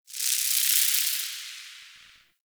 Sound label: Insect Wing Flutter; Warframe; Ambience; Nokko Colony